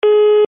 phone signal

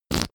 whoopee cushion fart short

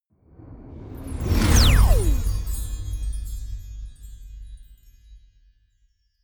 magic warp personal jump sparkle

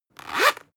zipper close fast

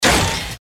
short industrial event

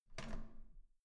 door handle down

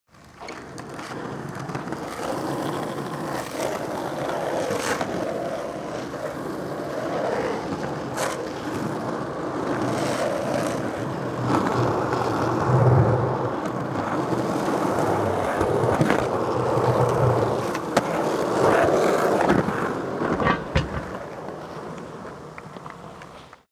skateboard drive multiple sequence

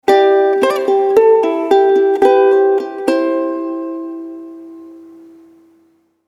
ukulele logo

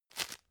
tissue grab

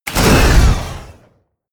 artillery missile truck fire

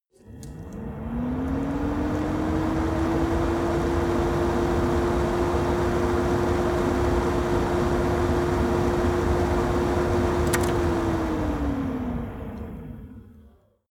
stove extractor fan medium

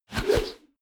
whoosh air

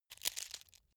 match take out

